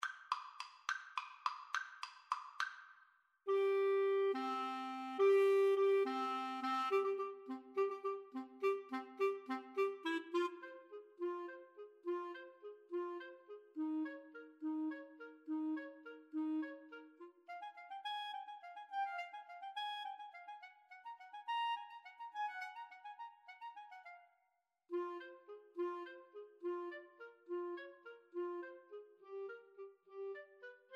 Clarinet Duet

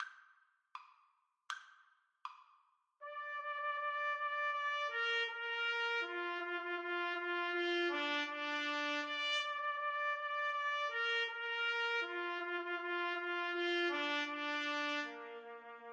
duos marcia, trumpet duet

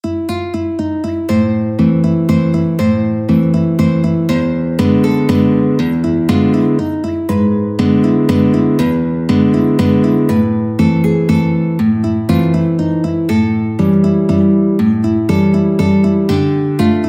guitar duet

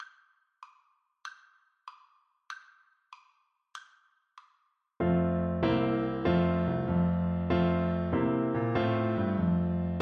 clarinet

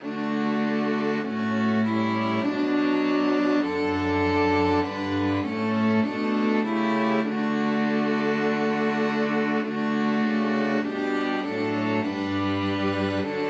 string quartet; abide with me

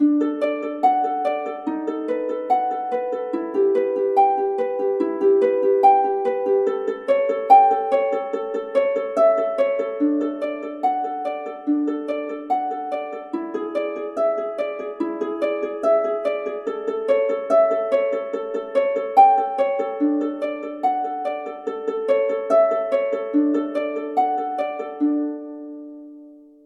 Prelude D Uke

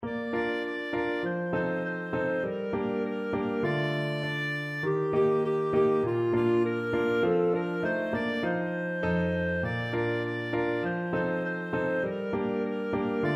old st nic, clarinet

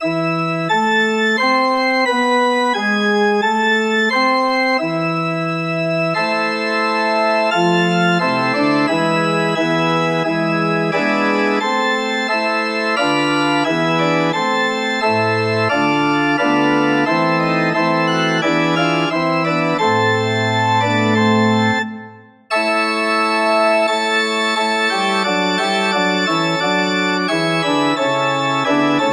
organ

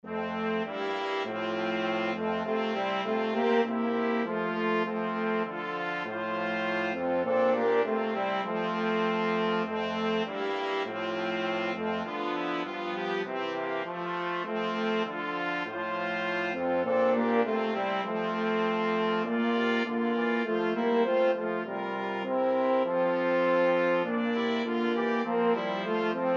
brass quartet

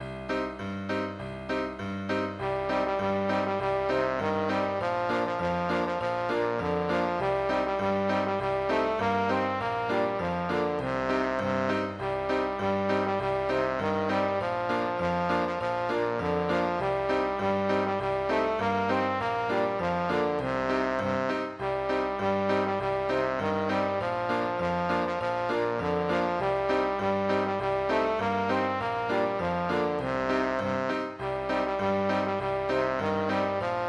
trombone

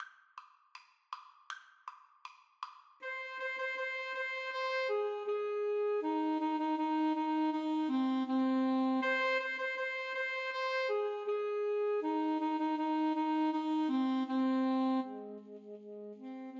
Alto Saxophone Duet

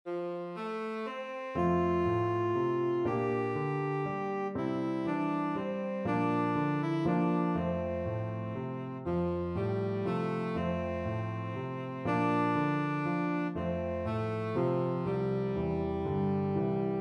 tenor saxophone

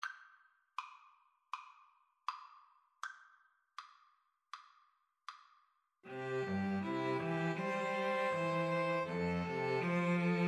farewell song, string trio

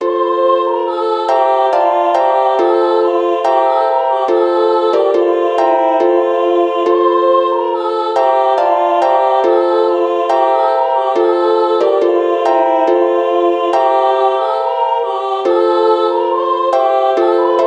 Uke, ukulele

